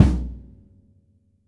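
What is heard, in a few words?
JAZZ KIT bass drum, Drums Acoustic; AKAI; JAZZKIT 2; 90s sample CD; USB Soundscan vol.10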